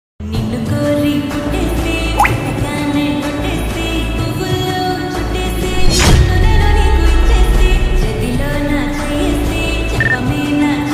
lofi telugu ringtone

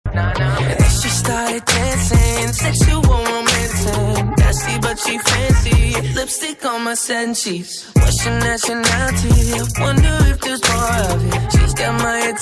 english ringtone

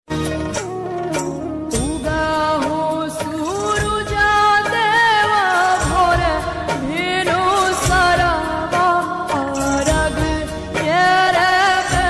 devotional ringtone

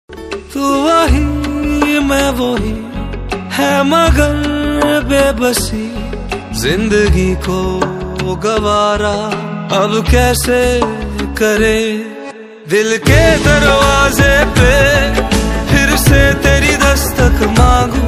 Ost ringtone

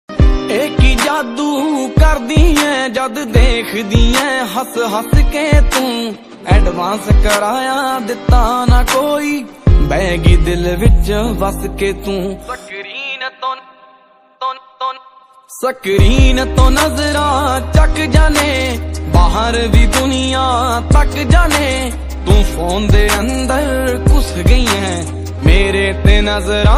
punjabi ringtone

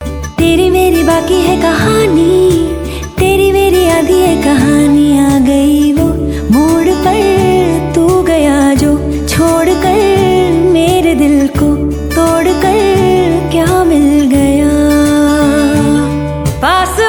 female hindi ringtone

